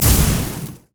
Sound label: foxfire missile; LoL; ahri